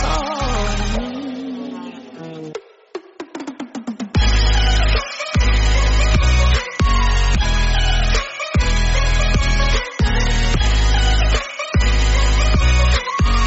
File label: classical music ringtone